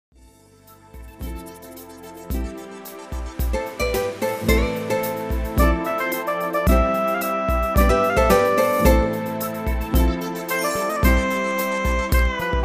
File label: music horn sample midi